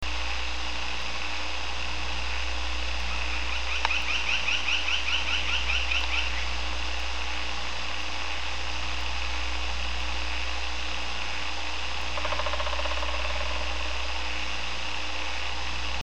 Carpintero Garganta Estriada, Lineated Woodpecker, Dryocopus lineatus; cantos